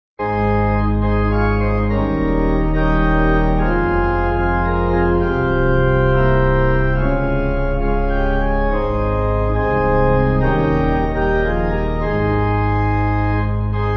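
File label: Pipe, Pipe Organ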